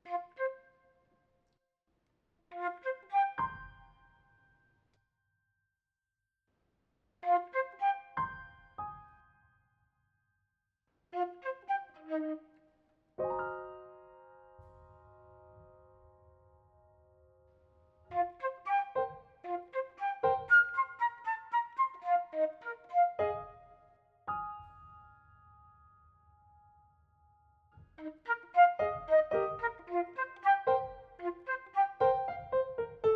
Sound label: Flute